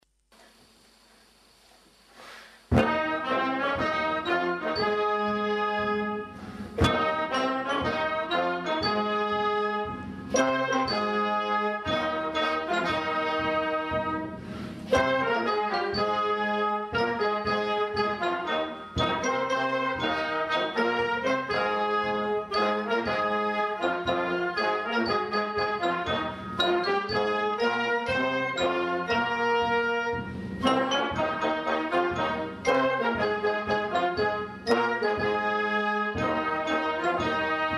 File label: cheering instrumental